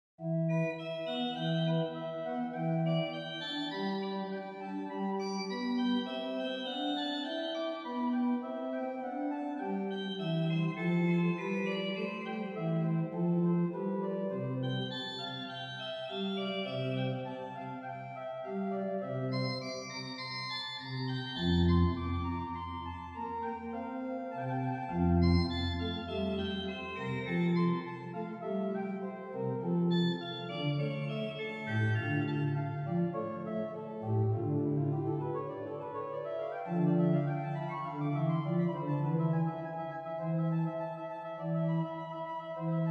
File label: Gloria Recit Dialogue Tierce Flute, organ; BeauvarletCharpentier; Souvigny